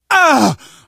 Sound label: hurt major, survivor voice, manager